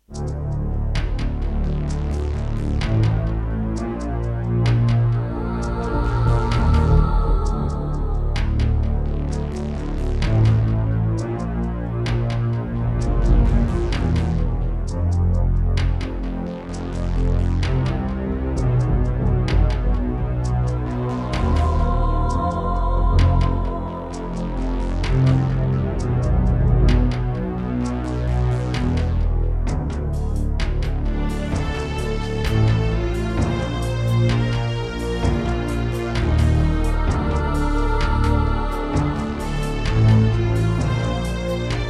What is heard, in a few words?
Yamaha MU2000EX XG MODE; Descent II